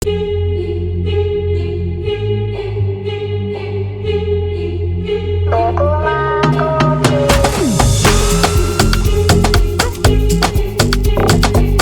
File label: Afrobeats Remix; Squid Game